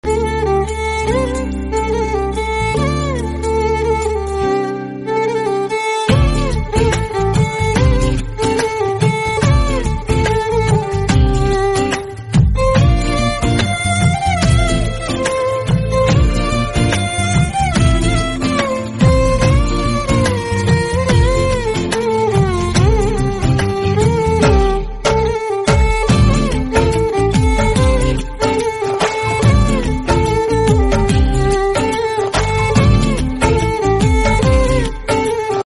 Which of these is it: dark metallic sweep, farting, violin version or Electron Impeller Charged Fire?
violin version